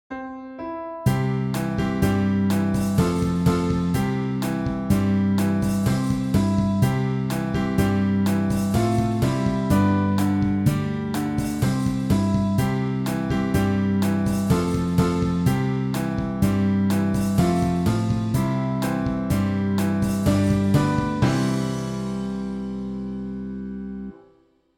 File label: keyboard